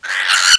luciola vespa die

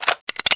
anklesnare

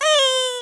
see otter damage

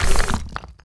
rakehand die

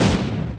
hells drive; hyper novice

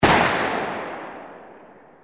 mine

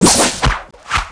knight spear boomerang

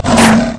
BATTERING, effect; spirit handler

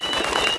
firewind kite attack

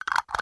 eggyra stand

